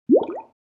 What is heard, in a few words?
Cartoon bubble; bubble sound effects